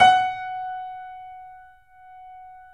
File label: 3D Pianos Yamaha C7; E-MU Producer Series Vol. 5